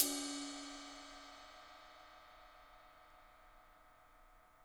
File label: ride lo; Sonic Reality